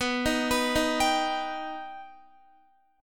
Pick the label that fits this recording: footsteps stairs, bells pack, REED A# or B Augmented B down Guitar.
B Augmented B down Guitar